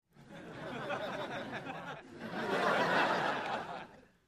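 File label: Crowd 100 Short Light Medium, medium interior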